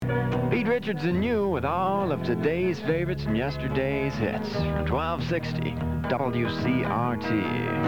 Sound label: jingle; wcrt